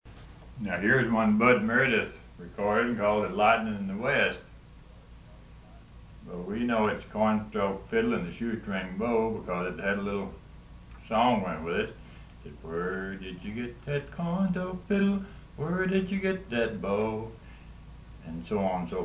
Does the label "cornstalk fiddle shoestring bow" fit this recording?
Yes